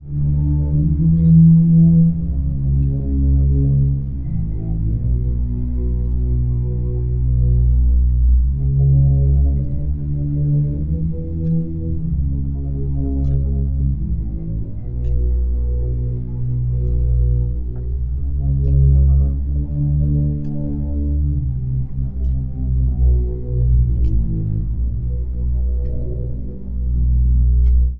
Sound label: bass, Sad; Loops